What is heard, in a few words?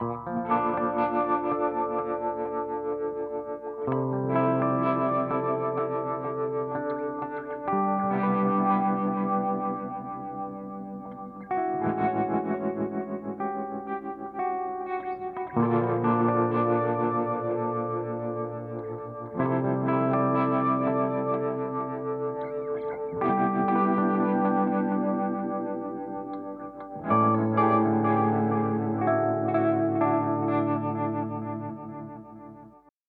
Strymon Timeline Tremolo